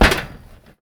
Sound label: metal grate, player footsteps